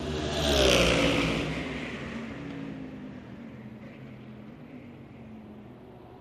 Camaro By Fast, chevy camaro

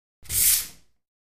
Ping Pong Paddle

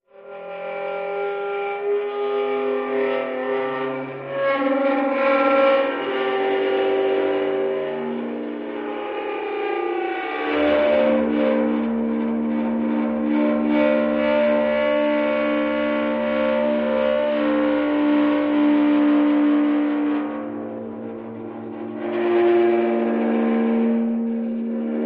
Dry Ice; Eerie Long Various Metal Scrapes.

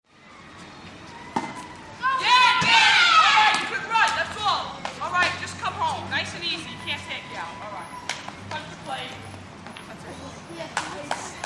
Boys Play Stick Ball N

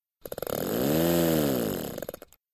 Motor Small Compressor, air compressors

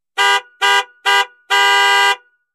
Expedition Horn Honk, ford expedition

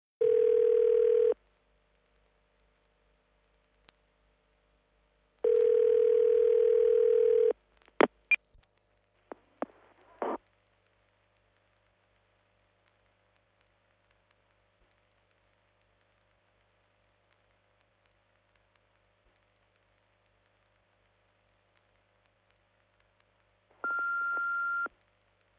Answering Machine; answering machines futz

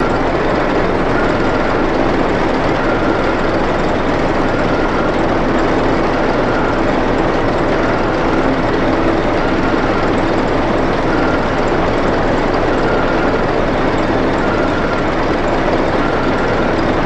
Generator Mechanic

